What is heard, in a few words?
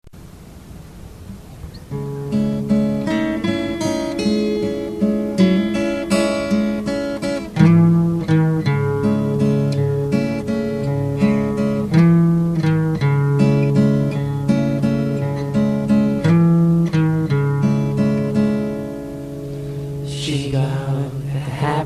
Whistling